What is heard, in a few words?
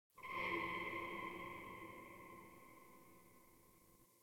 Metal Groan, Metal Groans; Warframe; Locker Room